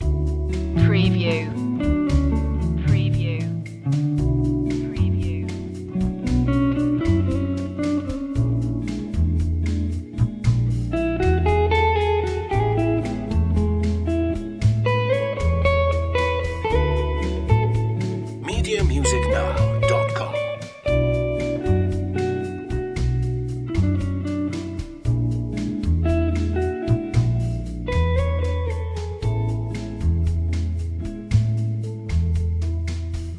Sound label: bossa melody loop; chill out music